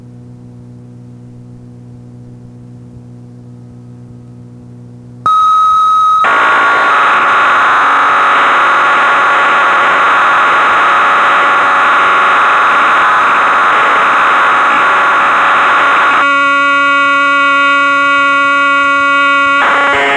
Drumulator

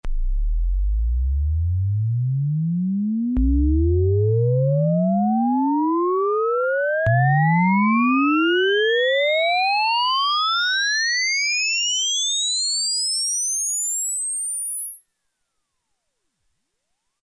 sound effect, Korg PolySix; Factory Presets Bank B

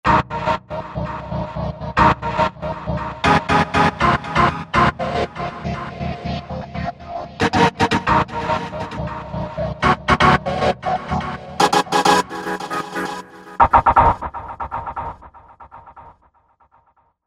Nicer Slicer

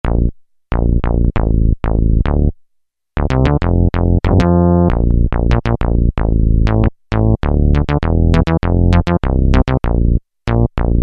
HiQ Bass, Guitar & Bass; Factory Voices

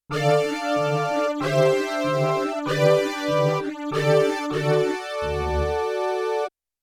Synth Brass II-dual; Yamaha TX16W; Orchestral Performance 1

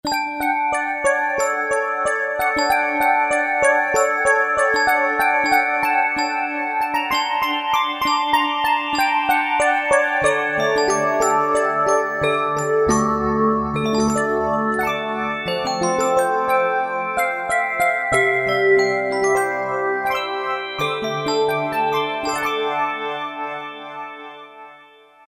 Yamaha YPT-400; Voices

